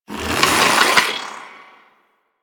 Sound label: Antler Bow Charge; DE; Warframe; Weapons